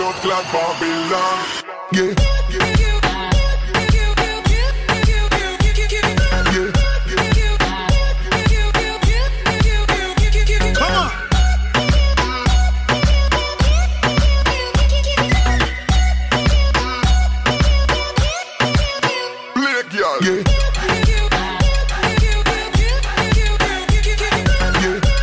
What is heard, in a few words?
babylon beat ringtone